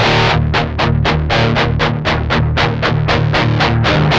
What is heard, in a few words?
8TH E, Guitars; Spectrasonics; Hans Zimmer Guitars VOL-1; 128-JACKHAMR